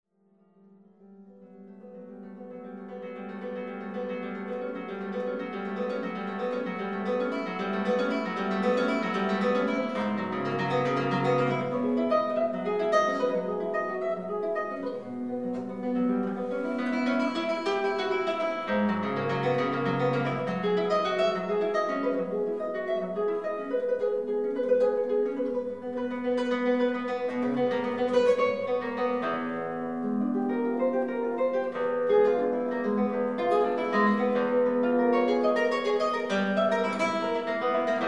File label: Toccata